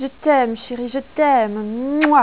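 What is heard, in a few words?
french kiss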